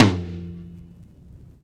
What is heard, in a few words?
Vinyl Tom